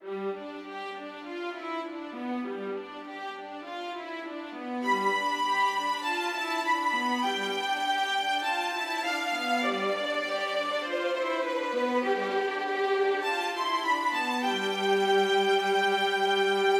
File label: Tremoro sustain